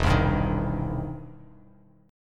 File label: E Minor Major 7th Sharp 5th, E, down, Bass Standard; EmM7#5 strummed